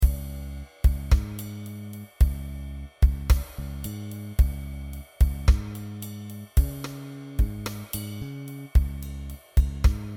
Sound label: Jazz Rock Drone Db 110; drones